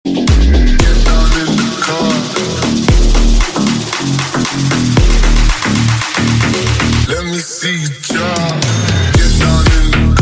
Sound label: In the Car Bass Ringtone